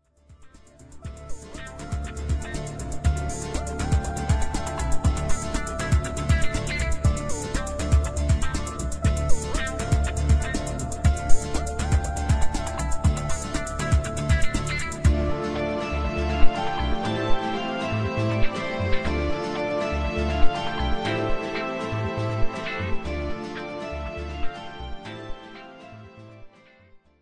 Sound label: industrial street